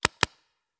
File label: Paintball Gun; Sound Ideas; Guns